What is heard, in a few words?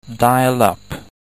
dialup